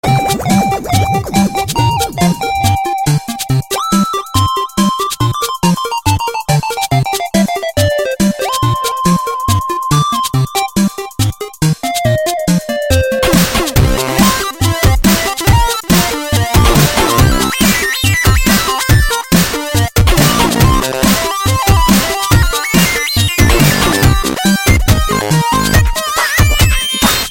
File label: Chiptune